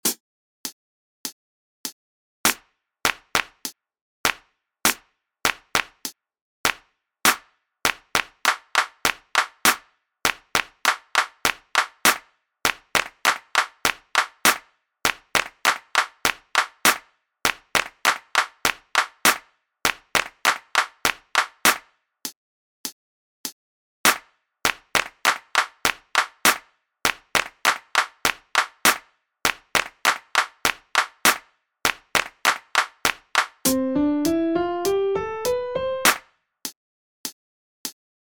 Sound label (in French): Rhythm n feel sans accord